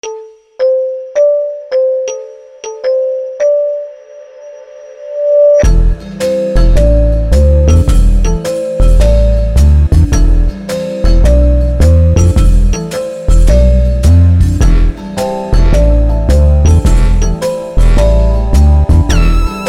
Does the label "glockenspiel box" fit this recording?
Yes